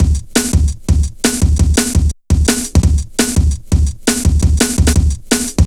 Steppa, Jungle, Drum Loops 169.5

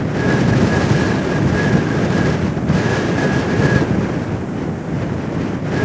COLD WIND, ESTUARY; Zero-G Ambient 1

